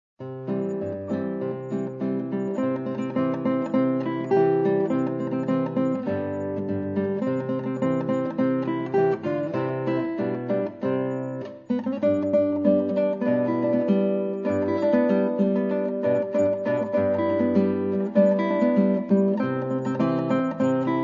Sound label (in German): solo gitarre; kinderlieder; der grune frosch